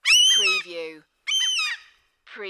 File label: Two Seagulls Caw Twice